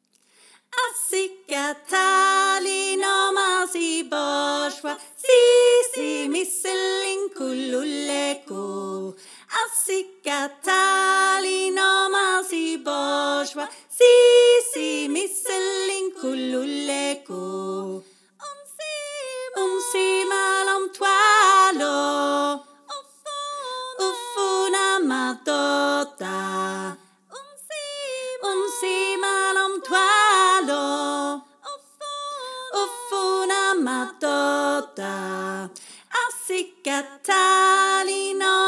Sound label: Bar Bas